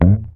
HOFNER, Bass; Sampleheads; Will Lee Bass Library; HOFNER SUS

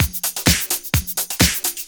Clubhouse Loop 128 BPM

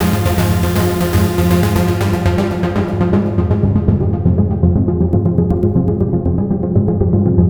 TRANCE NRG; Bass; TRANCE NRG2; Sonic Foundry (Sony Creative Software); Not Just Another Pretty Bass

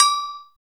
BELL AGOGO; Drums In Your FACE!

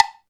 DM5 Perc, Alesis DM5 drum machine

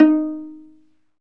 STR VLA, Chamber Pizz; Roland - String Master Series

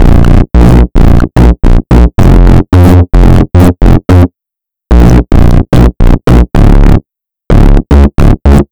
Bass Line; Eclectic Electronica

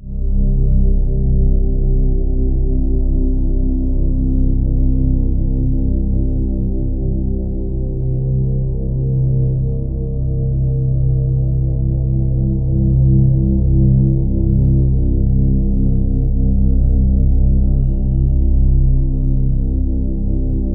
bass, Ominous Drones, LOWTONES; USB Soundscan vol.48; AKAI

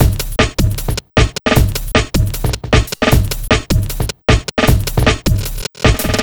Drum Loops, 154; HaveExplosiV; M8; Electronisounds

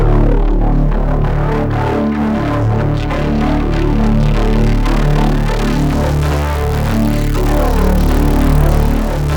MORPHUZZ; Spectrasonic Distorted Reality 1; MORPHUZZ BS

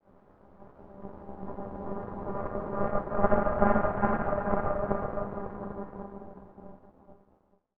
Interference G; Zero-G Seismic Frequencies; 90 s Sample CDs

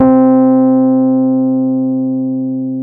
Rhodes c3, piano; Giga Samples Collection; Rhodes Mey2000

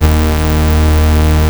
OB D#2, VOX, Synthesizer; Hollywood Edge - Giorgio Moroder Rare Synthesizer Collection; OB 4 VOX.

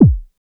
Moog BD; Moog Modular55

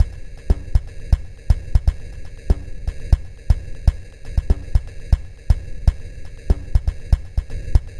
Minimalism, Processed Percussion

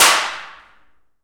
PRC ALMWA, Aluminum Wall; Rhythm Section; FX Perc

